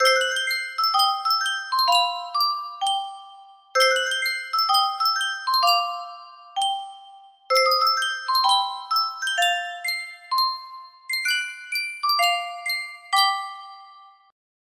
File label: Sankyo Music Box, music box melody